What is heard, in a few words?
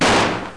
gunshot; wintron